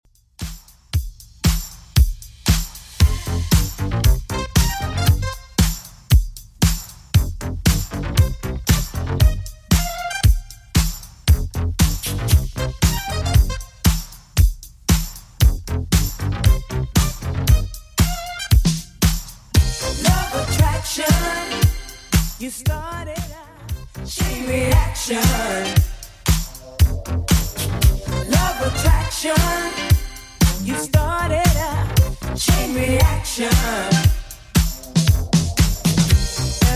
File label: 80s Redrum 116bpm